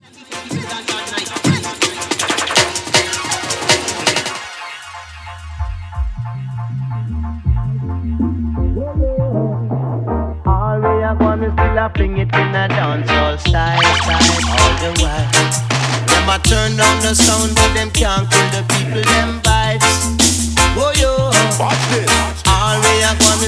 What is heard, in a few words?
Dancehall Style